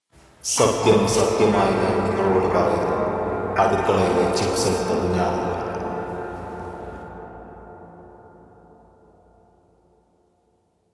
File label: cathedral; Adukala